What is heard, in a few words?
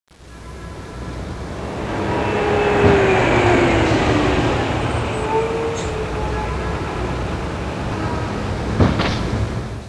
fast